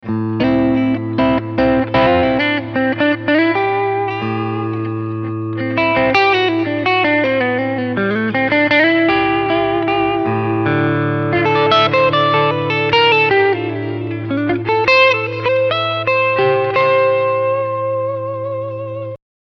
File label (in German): Beide PUs Clean